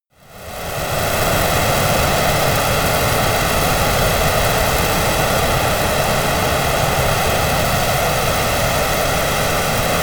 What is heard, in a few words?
military jet rafale turbine full slow down